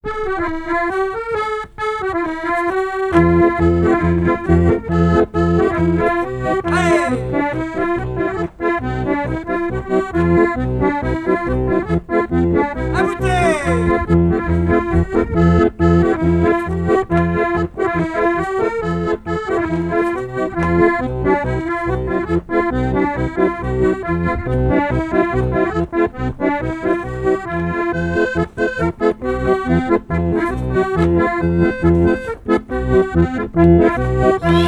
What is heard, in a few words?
alto